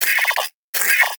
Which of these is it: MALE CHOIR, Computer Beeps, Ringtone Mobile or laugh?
Computer Beeps